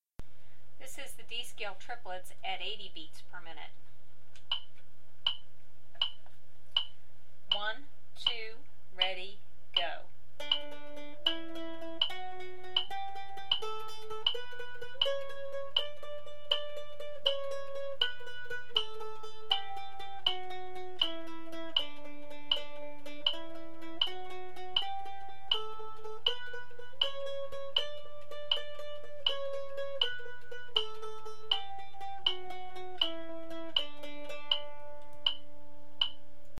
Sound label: D Scale Triplets 80bpm, mandolin